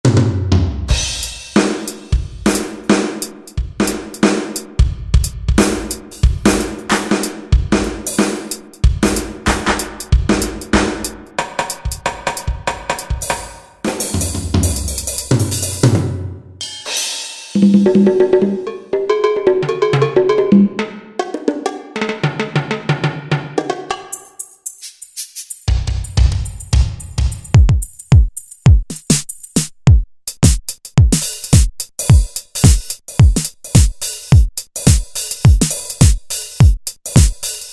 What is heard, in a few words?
Drum Kit 2, Korg T3